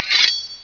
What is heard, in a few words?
knife ejec; mk2; Baraka